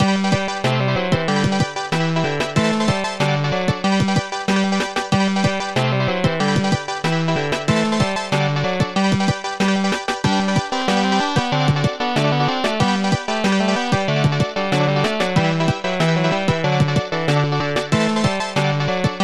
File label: DINGDONG